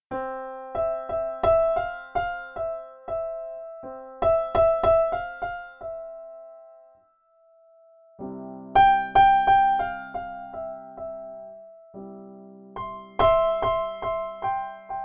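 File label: Baby Grand, Pianoteq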